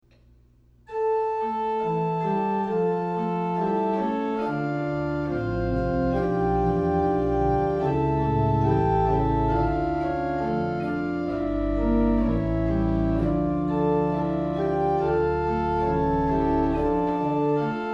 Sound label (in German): Bach orgel; Vater Unser; recorded by George Becker in July 2003, is intended to demonstrate the stops o